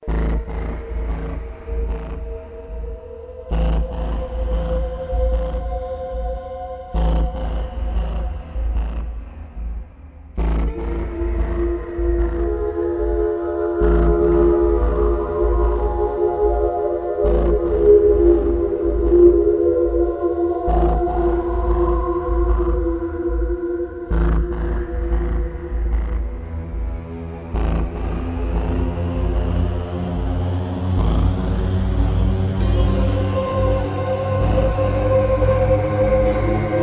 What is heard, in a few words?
song